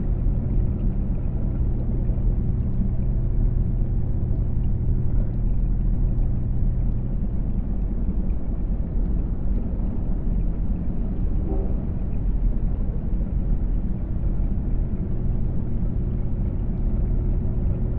ship colony ship idle; aquatics

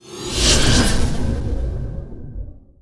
assimilate fire layer; apocalypse

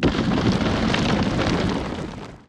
barren planet; ambient; Stellaris

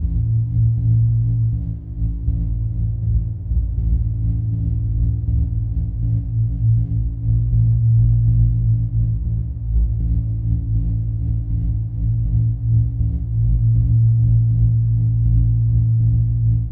fallen empire colossus energy field; apocalypse

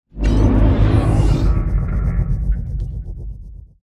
ringworld amb; Stellaris; utopia

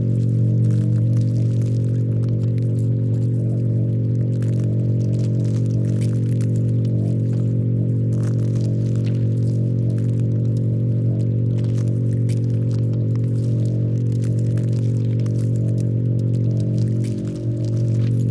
pro ship construction idle; biogenesis

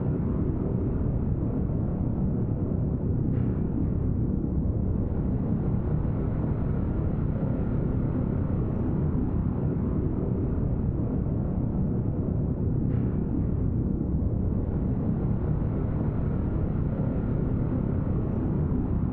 ship colossus idle; Stellaris; machineage

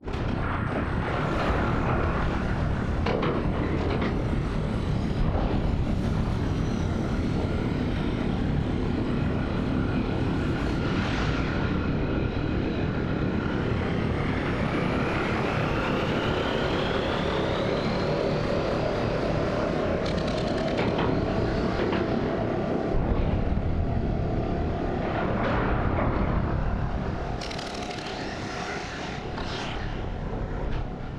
ship colossus open; Stellaris; machineage

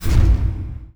space amoeba hit; weapons; Stellaris

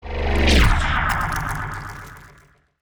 pro ship harbinger move; biogenesis

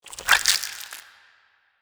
small legs up down; biogenesis

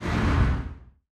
distance space whale hit, weapons; Stellaris

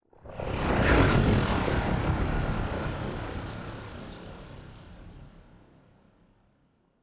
ship destroyer move; Stellaris; machineage

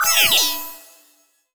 notification player level up; Stellaris; federations

